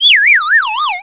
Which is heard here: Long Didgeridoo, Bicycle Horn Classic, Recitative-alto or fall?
fall